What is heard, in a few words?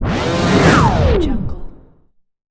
warp speed